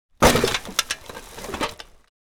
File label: Garbage bag throwing away with impact trash can